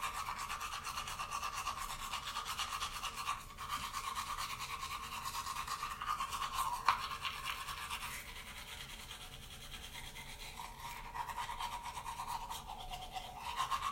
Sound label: brushing teeth; Golden Crayon Sounds